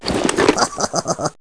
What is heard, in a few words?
Ui Upgrade Jetpack; Rabbids Big Bang; Android iOS Windows gamerip 2013